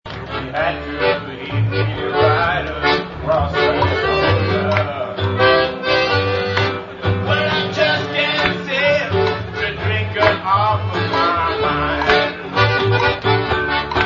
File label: honky